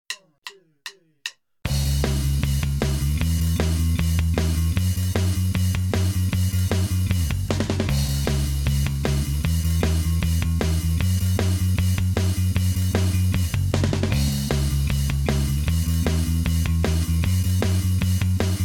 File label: Basstrack 154bpm; Chorus